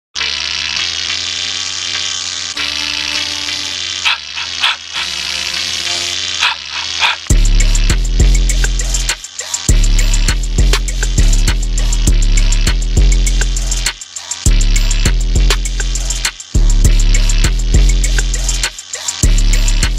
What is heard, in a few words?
Instrumental